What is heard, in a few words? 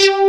TRON BASS; Pro-Rec - Synthsonic; TRINITY